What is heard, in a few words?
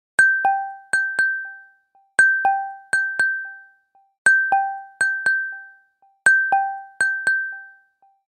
galaxy watch droplet